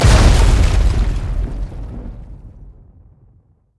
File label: dragons descent land; LoL; shyvana